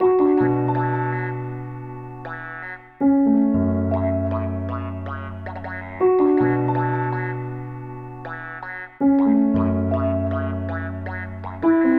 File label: Acoustic Piano